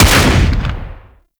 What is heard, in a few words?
Sniper Rifle Shot; Warframe; Grineer; Gorg